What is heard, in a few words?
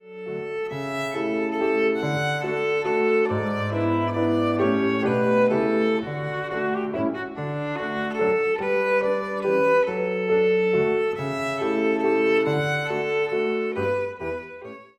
VIOLIN